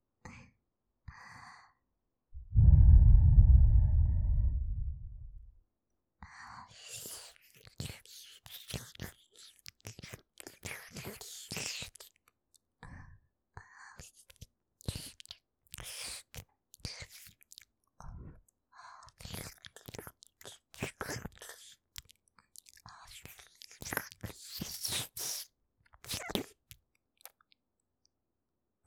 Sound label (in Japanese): キス; マゾハーレム